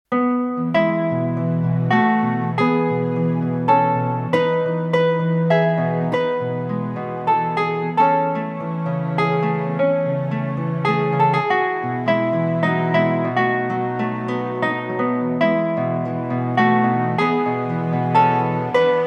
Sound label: adagio for guitars